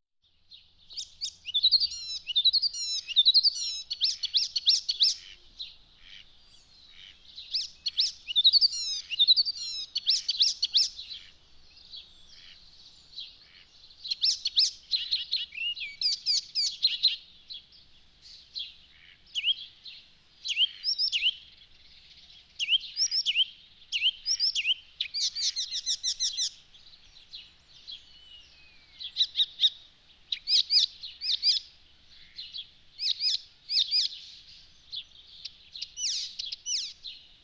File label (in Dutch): Spotvogel, vogelgeluiden